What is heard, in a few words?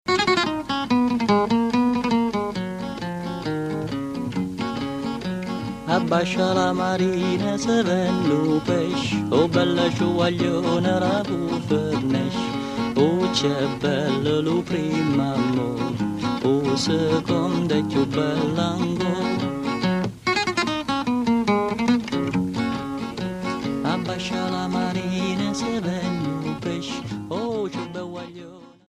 Italy Bari Stornello Baresi